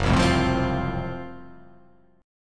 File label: F sharp Minor Major 7th Flat 5th F sharp down